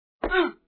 Hit

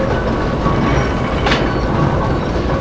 Gears

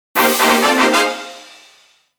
Jingle Control Tower; culdcept revolt original soundtrack dynamix 2017

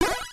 Retro

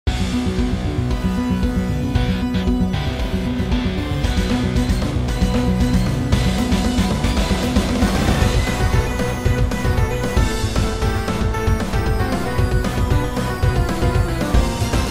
Single Bomb Rain (Intense)

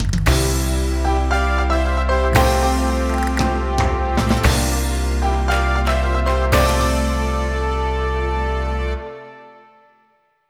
(Jingle) Game Start (Flamenco); wii fit u

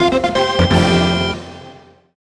Stage Goal; Kirby Planet Robobot; Hoshi no Kirby Robobo Planet

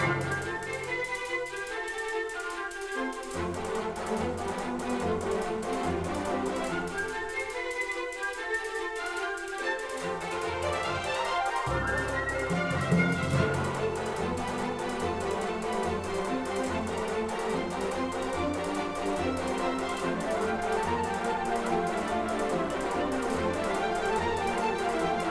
Music Generic Heroic 144bpm Loop

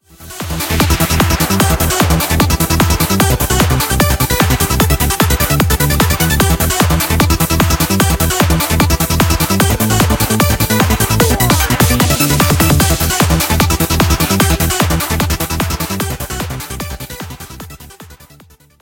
BGM xfade; Invaxion

